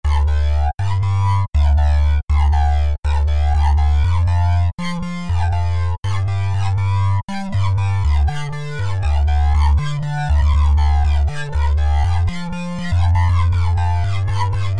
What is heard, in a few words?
Electro Lash Heavy Synth